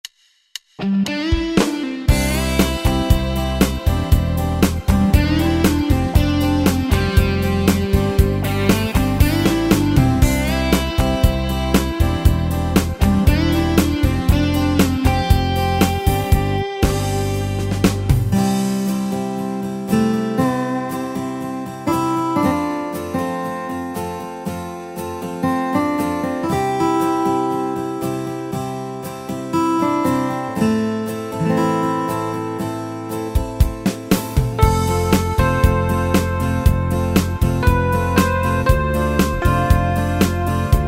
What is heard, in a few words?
New Country; Yamaha PSR-3000; Factory Styles